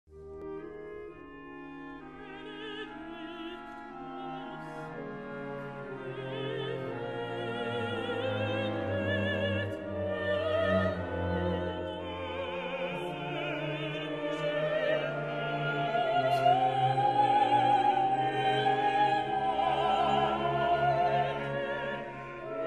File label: messe benedictus